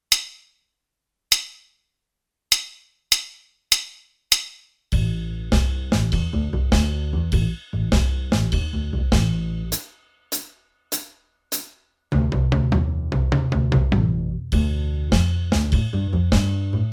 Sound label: Bluesy Riff Etude